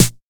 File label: snare